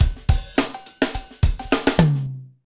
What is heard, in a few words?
Hip Hop, Drumscapes Roland; Northstar